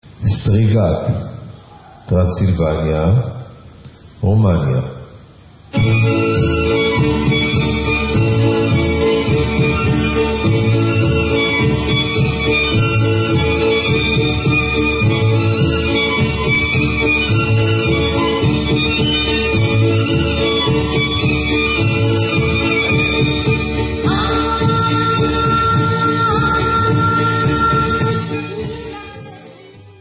De Strigat Rumanian

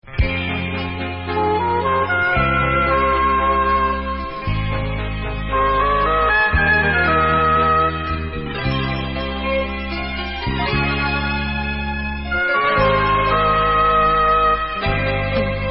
Instrumental

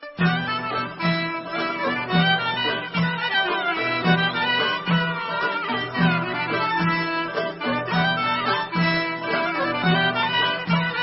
Greek Vlach; Antipera

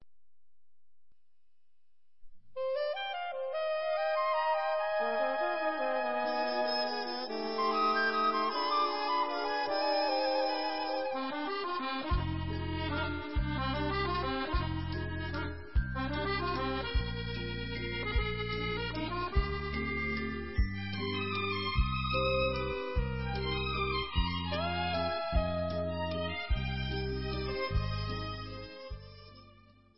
Vals, Instrumental

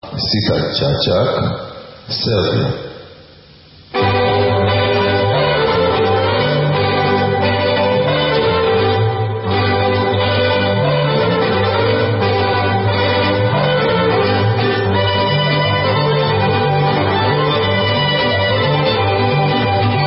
Serbian Instrumental

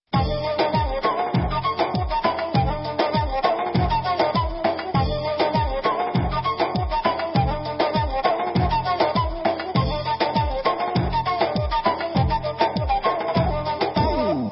Turkish

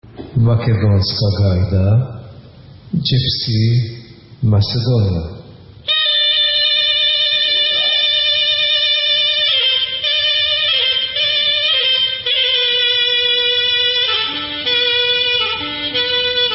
Macedonian Instrumental; Makedonska Gajda

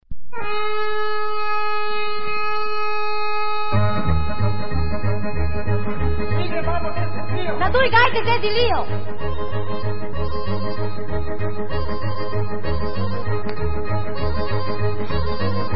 Staro Planinsko Kolo Serbian Vlach

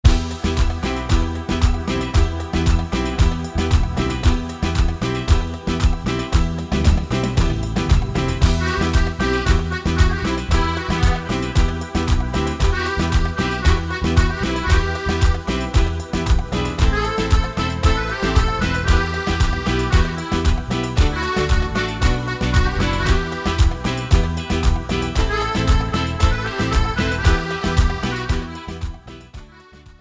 instrumental

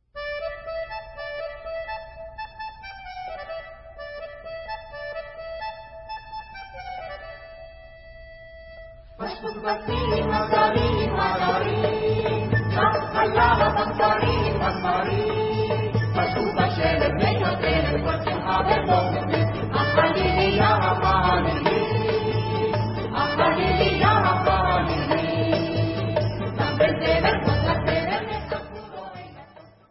Vocal

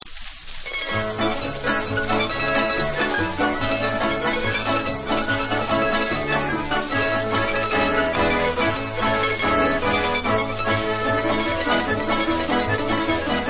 Kolo, Folk Instrumental

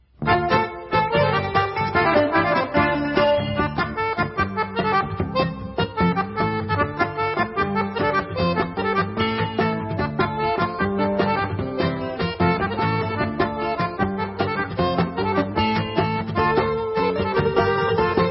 Debka, Instrumental

